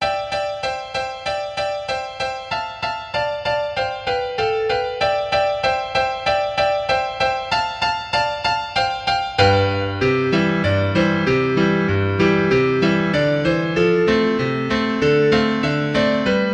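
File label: JINGLE BELLS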